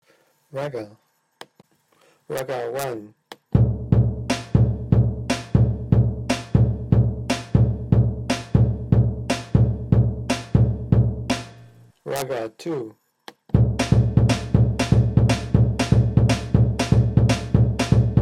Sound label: Ragga